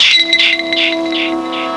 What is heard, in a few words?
Fx Snip; Zero-G Jungle Warfare 1, 2 & 3; 90 s Sample CDs